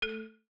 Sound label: paytable click; netent